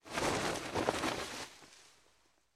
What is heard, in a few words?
Flag Flaps Flag Flaps; flags